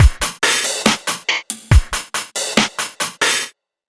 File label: dubstep